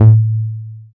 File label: Sine Bass A One Shot; Drum & Bass